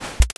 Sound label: drop